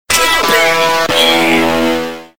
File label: jingle